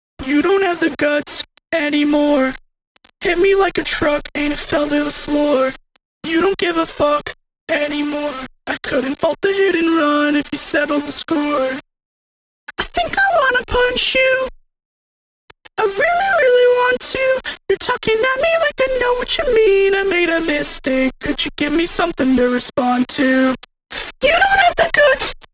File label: everybody's dead - vocal